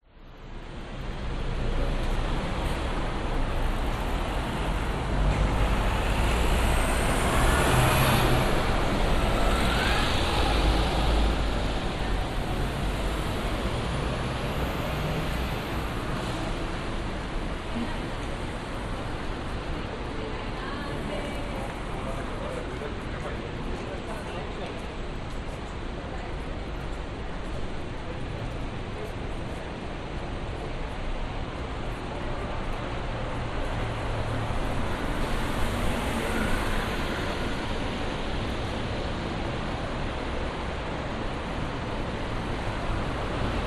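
public places city street ambience bus stop pedestrians traffic city hall bell strikes; 5pm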